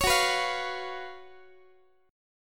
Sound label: Gb Major 7th Suspended 4th Gb Guitar